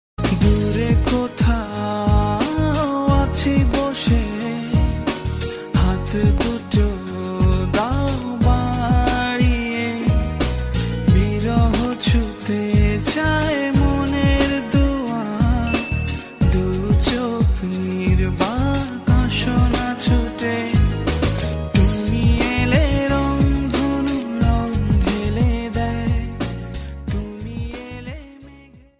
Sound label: bangla